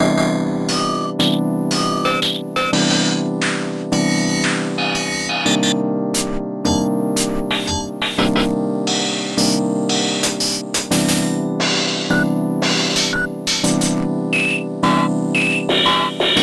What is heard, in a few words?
bitware hihat